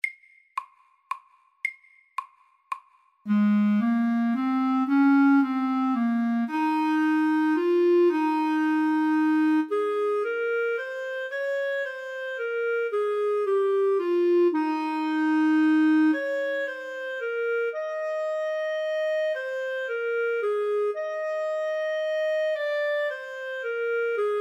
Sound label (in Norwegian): Legato etyde FORT